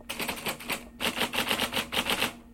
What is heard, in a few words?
typewriter; typescript